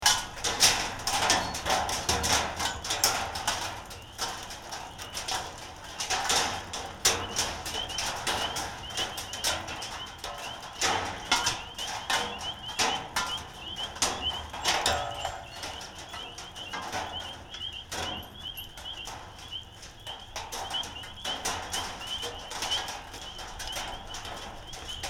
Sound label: Last Of Hail Storm